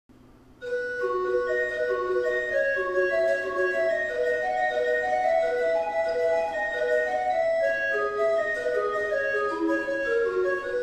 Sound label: Grob Gedackt und Quintadena 8, Tannenberg Organ; Gemeinhaus Saal - Old Salem, North Carolina